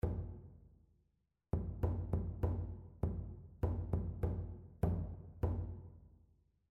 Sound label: rhythmic error detection